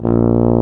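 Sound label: brass, C Tuba; Tubas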